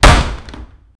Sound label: destruction